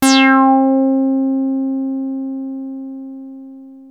303 C4, TB 303, Synthesizer; Hollywood Edge; Giorgio Moroder Rare Synthesizer Collection

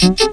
noise 2, sound noise; NOISE+ALARM

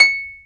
HARP C#7; AKAI S-Series CD-ROM Sound Library VOL-2

